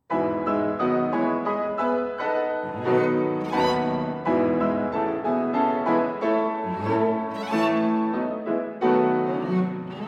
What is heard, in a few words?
Piano Trio; tchaikovsky piano trio in a minor op 50